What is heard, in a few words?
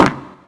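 backfire exterior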